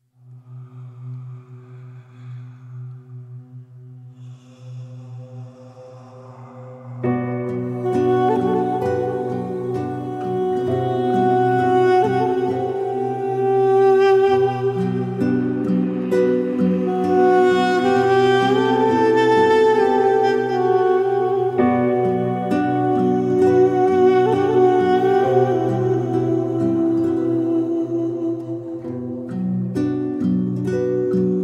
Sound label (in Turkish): duduk versiyon; hudutsuz sevda orijinal dizi muzikleri